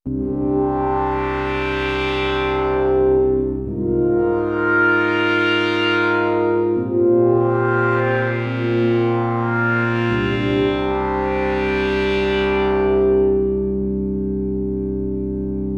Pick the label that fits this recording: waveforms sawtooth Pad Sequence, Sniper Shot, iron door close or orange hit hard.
waveforms sawtooth Pad Sequence